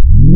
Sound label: Sinarmonic, wavetables; Massive 2-Basic